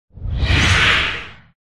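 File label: Fire Spirit buff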